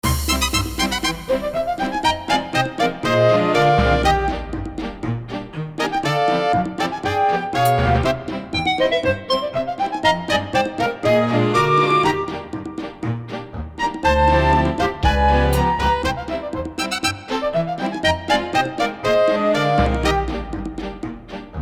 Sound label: Orchestral, MT-32